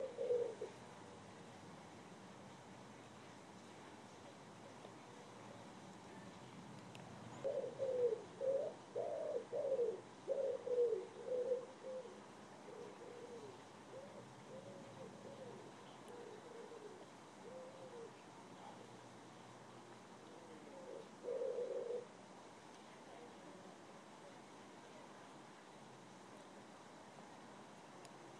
coo coo of the wood pigeons

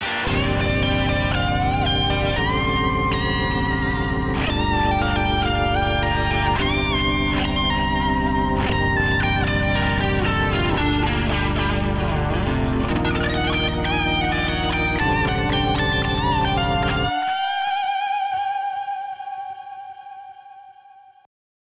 neoclassical solo; new stoneblower

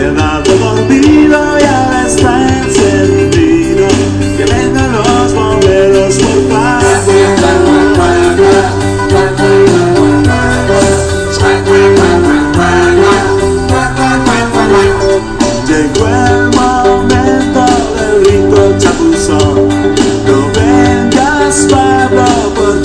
Peruvian rock while in traffic

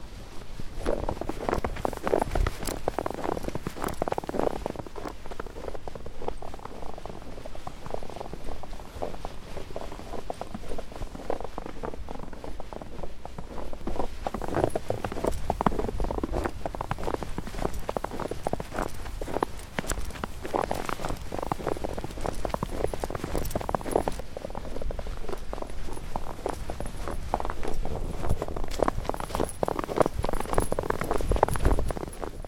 walking in fresh snow keys and coins jingling

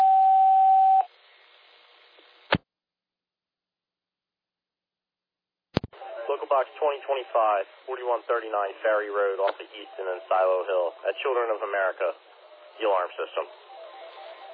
alert page; plumsteadville

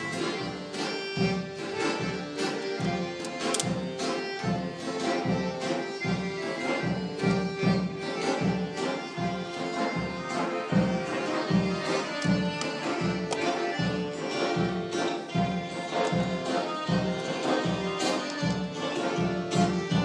Pontian music from Black Sea Turkey

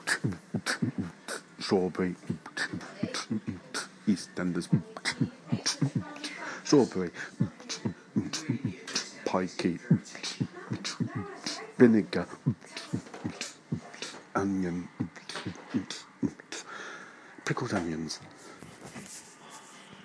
beatbox

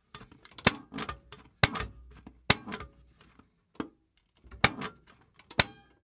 metal movement

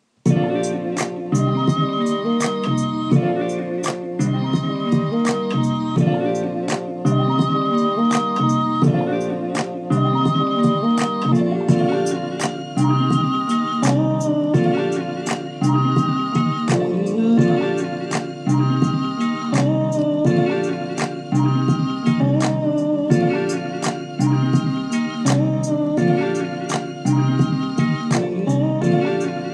new beat

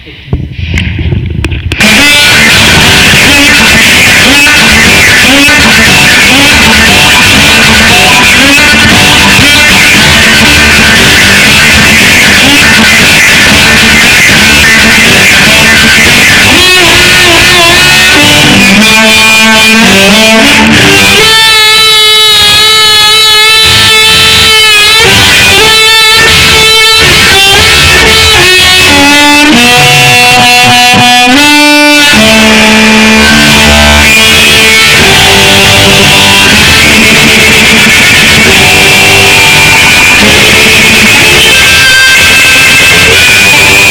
extreme harp terror

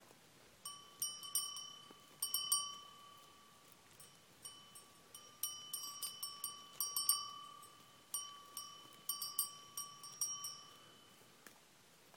sheep bell

